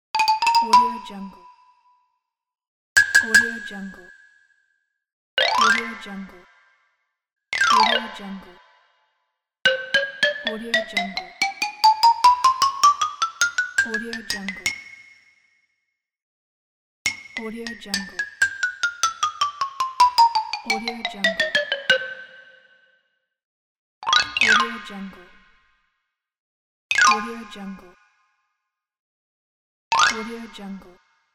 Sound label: cartoon xylophone